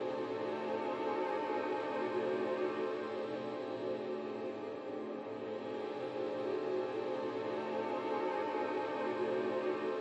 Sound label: Tension; Stage 02 Predator Hole Tension 04